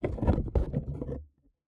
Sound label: box Enter, Cat; A Cat Game